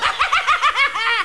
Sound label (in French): "rire"